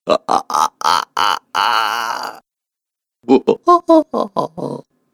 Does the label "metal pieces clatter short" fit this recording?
No